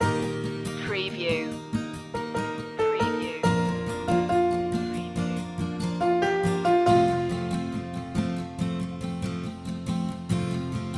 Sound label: acoustic